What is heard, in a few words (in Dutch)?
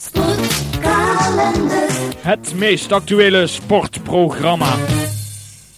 Jingle zang; Sportkalender